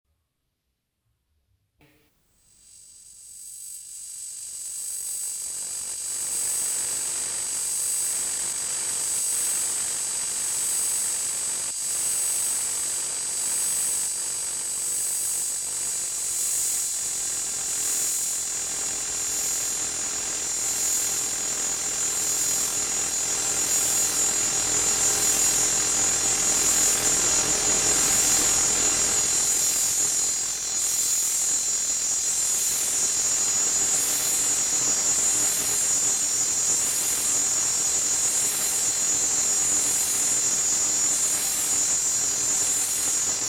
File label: perfumed metal